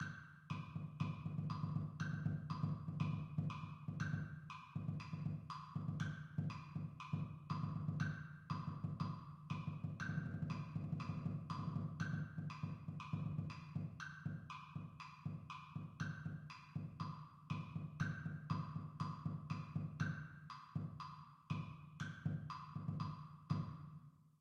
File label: drumline